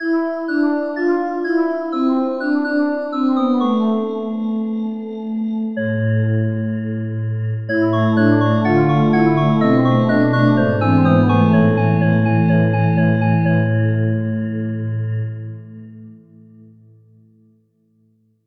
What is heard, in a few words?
bowed glass